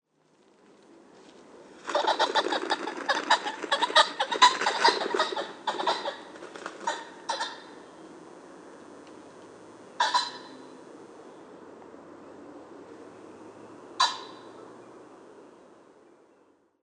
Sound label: Ring-necked Pheasant, Phasianus colchicus; gamebirds